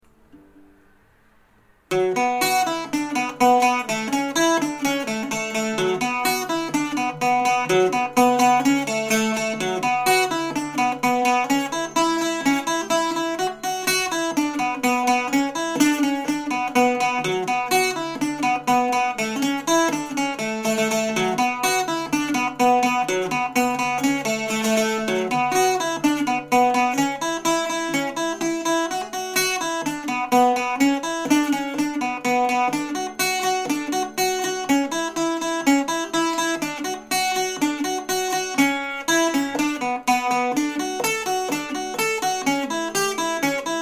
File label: Man of Aran